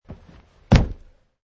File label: Landing; Man wearing jeans and hiking shoes jumping and landing on carpet surface - variation 23; Wrestling and Struggling; Michael Huang